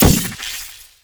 portal gun fire blue; weapons

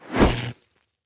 stunstick swing; weapons

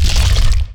portal open red rock; weapons; portalgun

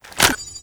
ar2 push, weapons

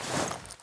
weapon movement walk; weapons

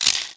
357 spin, weapons

